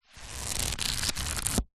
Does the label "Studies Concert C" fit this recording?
No